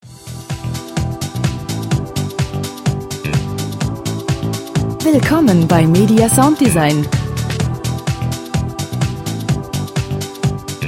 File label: percussion